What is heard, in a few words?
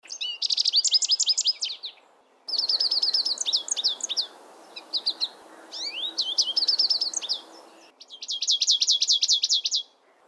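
american goldfinch; calls; Audubon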